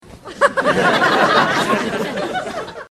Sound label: very low laughter